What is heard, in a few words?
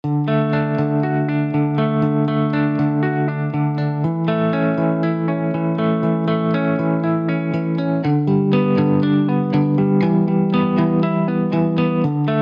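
arpeggio tequila bar; Les-Paul-Modell; Session Guitarist Electric Sunburst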